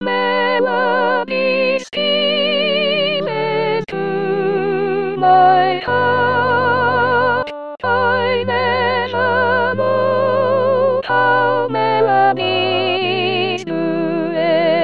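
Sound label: soprano; Dvorak; Songs of Nature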